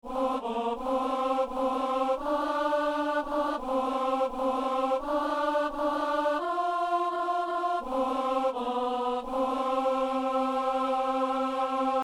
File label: alto; Psaume 66 (67); Messe du 17 janvier à Saint-Denis